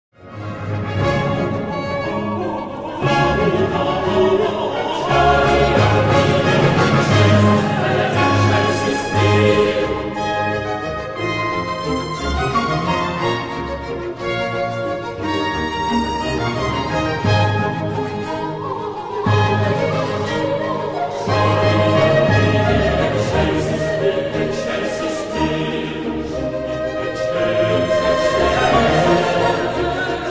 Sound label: bach mass